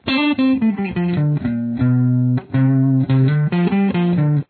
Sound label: outro lead